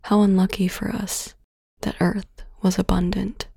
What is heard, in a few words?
Cinematic Female Vocal Sample